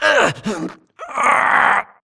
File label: grunt Struggle; Titanfall 2